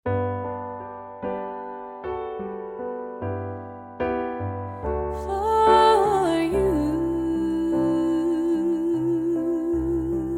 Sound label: funky gospel choir